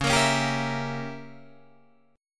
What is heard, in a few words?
Db Diminished 7th Db Guitar Drop D